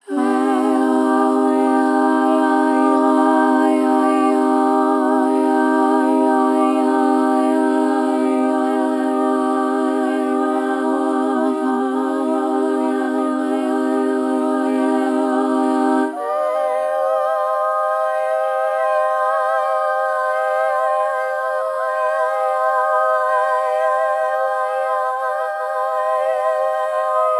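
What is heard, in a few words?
Drones, sustaining a C minor chord in two octaves; vocal samples; Void & Vista; Kontakt; Designed/Drones/Fog of War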